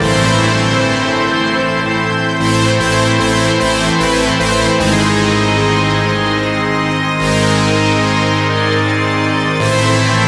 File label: Intro Loop